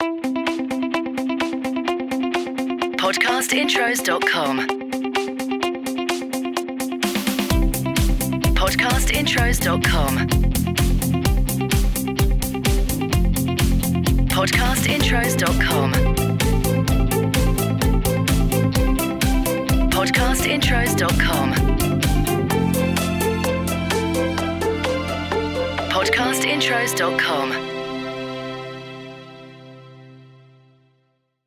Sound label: MOTIVATIONAL; Podcast Watermark